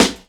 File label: Snare; Vengeance Samples